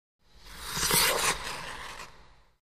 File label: Ice Skating Smooth